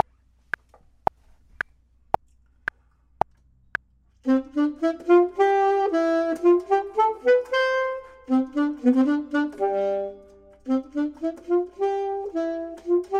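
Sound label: bottom, Saxophone and Clarinet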